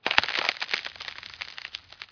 hog freeze; hedgewars

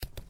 steps; hedgewars

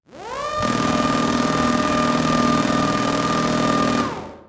minigun

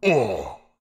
hell ow

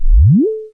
warp; hedgewars

